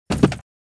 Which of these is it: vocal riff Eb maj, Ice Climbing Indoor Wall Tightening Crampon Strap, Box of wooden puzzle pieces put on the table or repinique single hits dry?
Box of wooden puzzle pieces put on the table